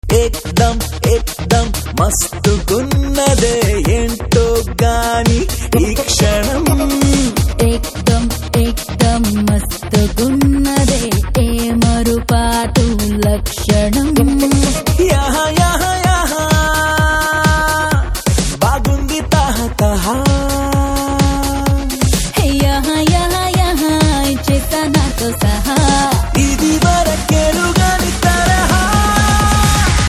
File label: Ringtone; Pantham Movie; Telugu Ringtones